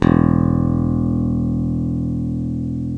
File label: ELECTRC BASS; OMI - Universe of Sounds